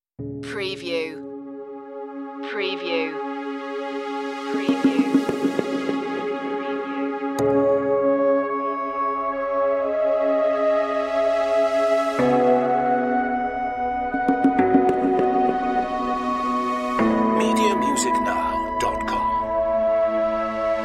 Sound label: ocean flute